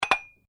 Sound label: Weights Dumbells Impact, Gym weight lifting equipment; Sports